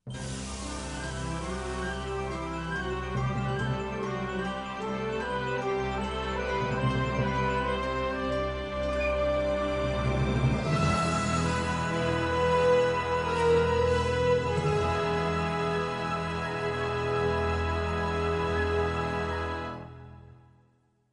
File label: movie opening ringtone; english ringtones